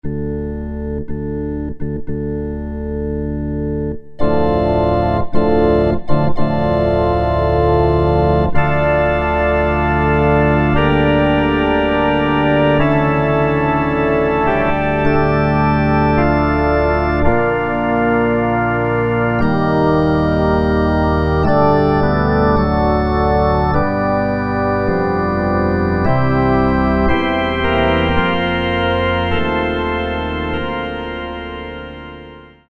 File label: Mega Pipes, Kurzweil K2000; Kurzweil K2000 V3